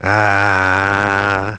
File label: uhh